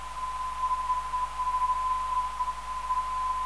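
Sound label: VIE 405kHz, non-directional beacon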